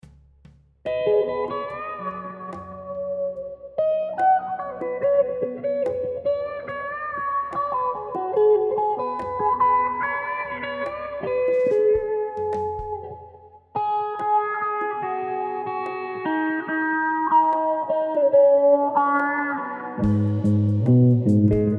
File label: slo funk cue